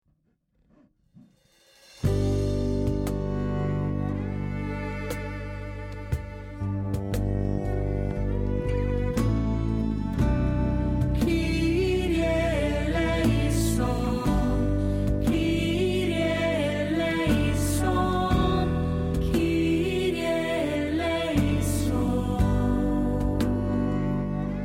kirye eleison cello

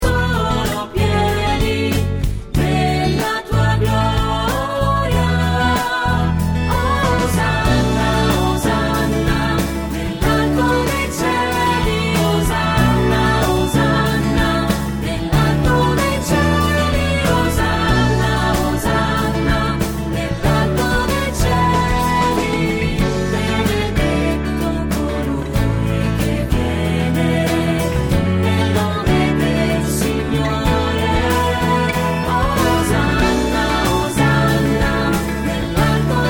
santo chit ac ritm

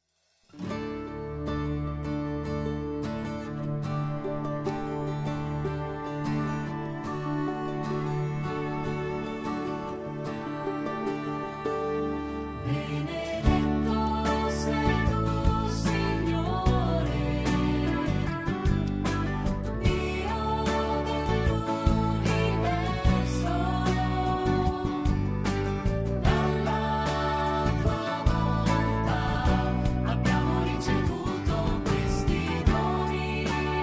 davanti a te chit ac guitalele

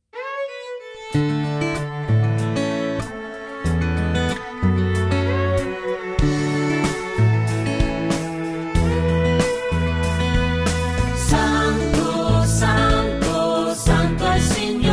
santo chit ac arp

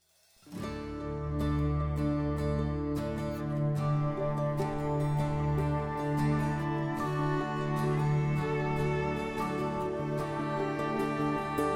davanti a te basso

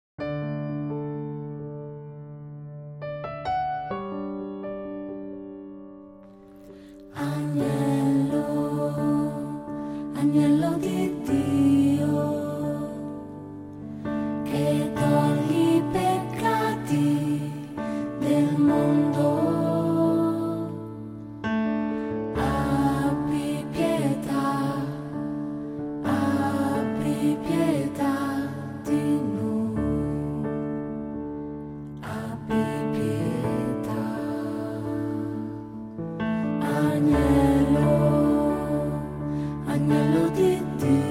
agnello di dio voce lead

